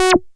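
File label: SHORT ACID, BASSES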